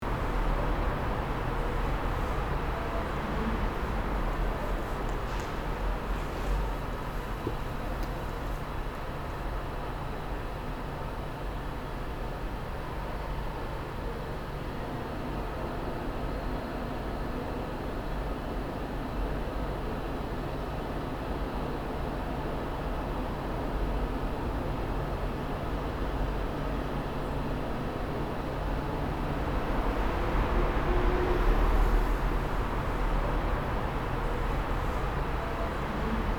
Empty train station at night industrial ventilation loop